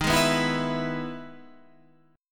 E-Major D sharp

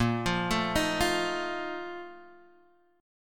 Bb Major 7th Suspended 4th, Guitar Standard; x,1,3,2,4,1; arpeggiated

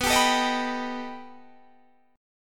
B Augmented 7th B

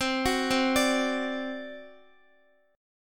C Suspended 2nd Flat 5th C Guitar; arpeggiated; 4F,4A,5C,5G,5C,6E; x,x,12,11,12,10; JGuitar

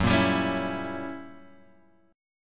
F sharp 7th Flat 9th F sharp down Guitar; strummed; JGuitar

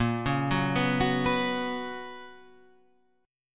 B Major 7th Suspended 2nd A sharp, chord shape

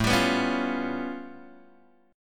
A Augmented 9th A Guitar Open G

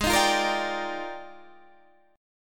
F Minor 13th, G sharp

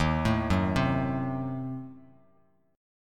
D sharp Diminished 7th D sharp, Bass Standard; D#dim7 arpeggiated; 11,12,4,5; JGuitar

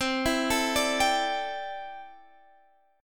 C 6th Add 9th, C, down, Guitar, Open G

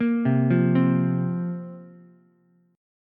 Bb Suspended 2nd Bb Ukulele; Bbsus2 arpeggiated; 3,0,1,3; JGuitar

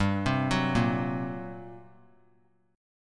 G Minor Major 7th Double Flat 5th, G; arpeggiated; JGuitar

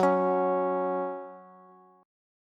F sharp 5th F sharp Bass

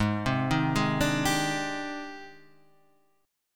G sharp Major Sharp 11th, Guitar Half Step Down

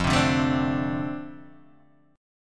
F 6th F down Guitar Open D

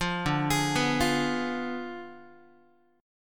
D Diminished 7th D down Guitar; arpeggiated

